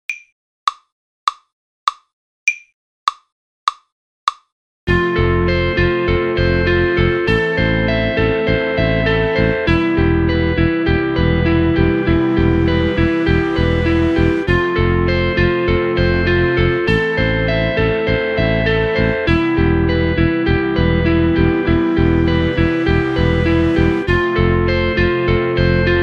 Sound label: Kit Solo G